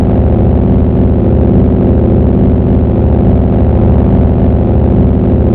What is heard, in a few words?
plane